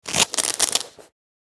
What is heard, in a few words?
Velcro small patch tearing